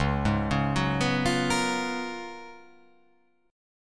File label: C sharp 13th C sharp